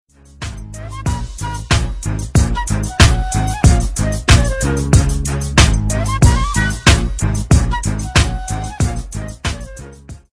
west instrumental

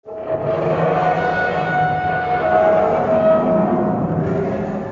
roar; Wendigo

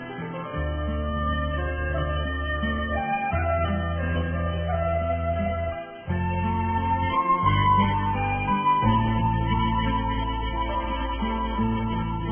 Sad Cantonese Song

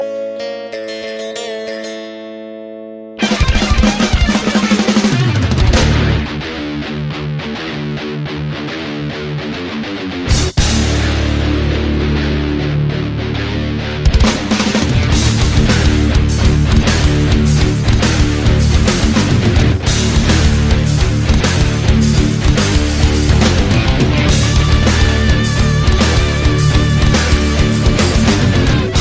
Drum Solo

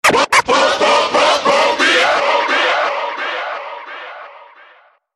Scratch

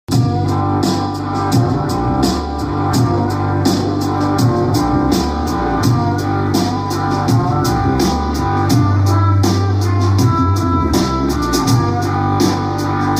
Guitar Jam

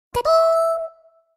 Screamer

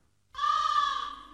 Single Anime Crow

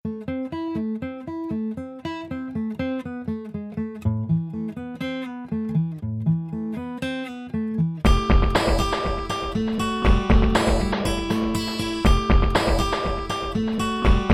Guitar Tune

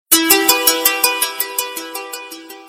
Msg Tone

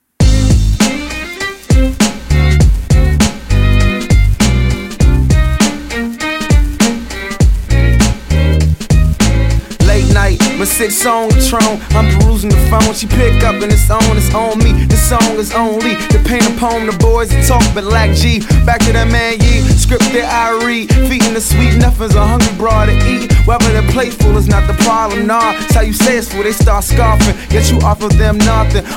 Wale Strings

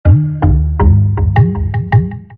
message sound

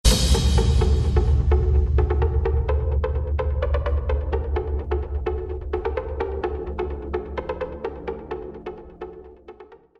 Trance Tone

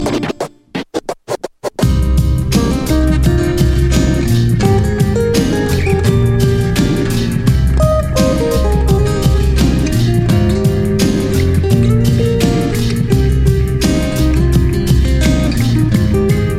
Acoustic

